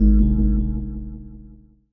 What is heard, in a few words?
creep door, Drum N Bass; Sonic Foundry (Sony Creative Software); Knutrix Drum & Bass